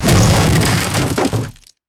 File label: heavy rock debris crashing and settling